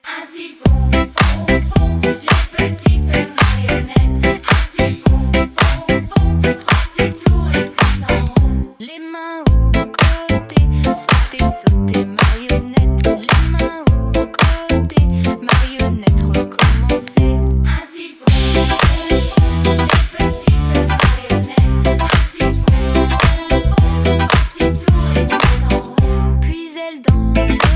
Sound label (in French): ainsi font karaoke; comptine